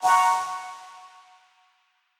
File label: Quasar Clear B; Mixolumia